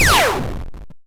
laser, weapons

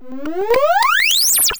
poweron

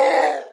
screams human

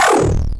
screams robot

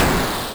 soft, explosions

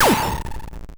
odd, explosions

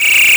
referee whistle

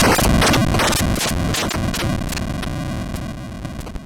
various, explosions

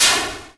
soft, explosions

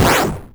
hard, explosions

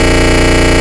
car loop; movement

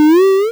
powerup

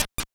stairs loop; movement

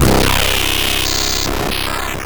mechanical noise

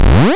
jump

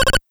alarm loop

